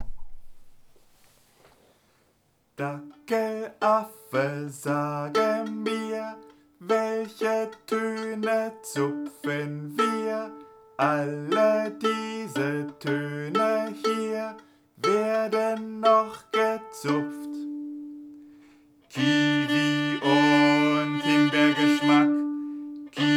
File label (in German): Gesang